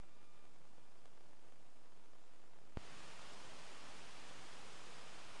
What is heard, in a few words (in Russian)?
shum am potom fm